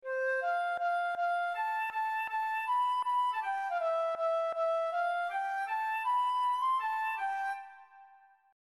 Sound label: Cadence